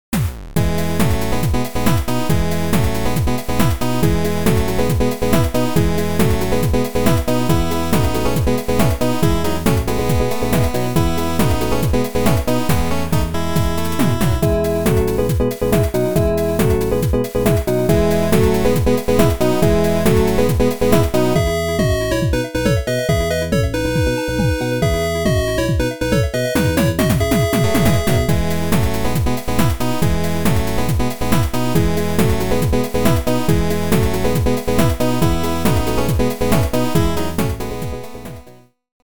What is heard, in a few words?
battle; marioRPG